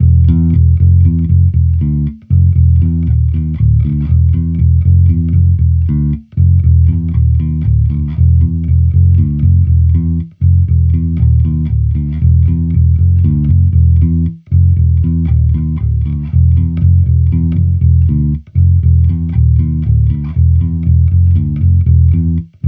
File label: Amped loop 118 E, Electric Bass Loops; Slate Digital BASSHOLE Sample Pack